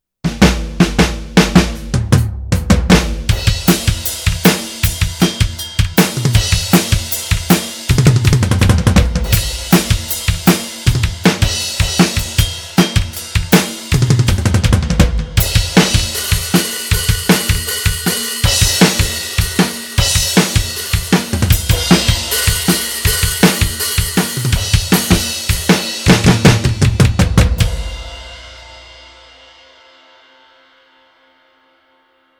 Heavy Rock Kit; ATV EXS3

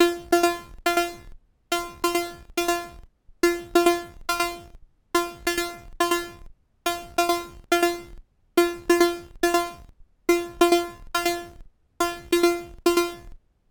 Release Noise; Demon Core

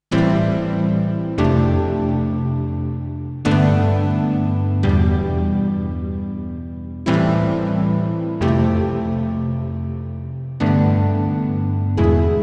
Pluck

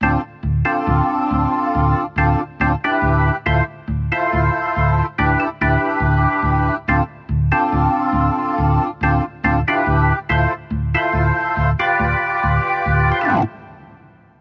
Rock Organ Scan Vib

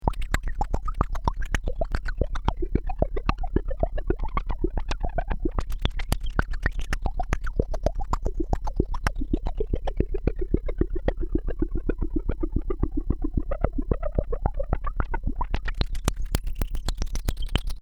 fm rhythm

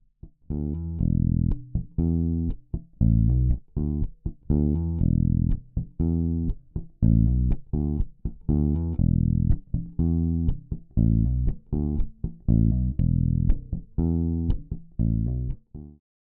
LAKELAND MuMa TH Drive 0 EQ Flat

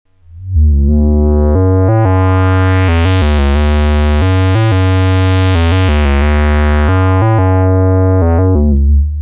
Korg MS-10

